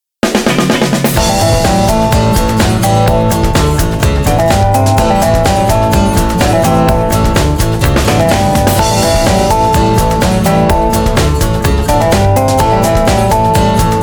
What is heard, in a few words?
Country Rock